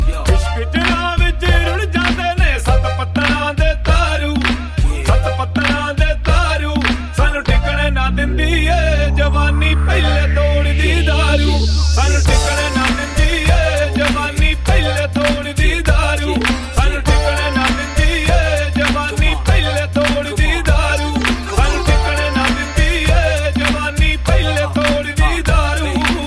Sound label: piano, ringtone; blues